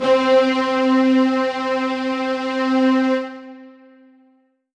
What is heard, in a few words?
Ensoniq ZR-76 Dyn Marcato C4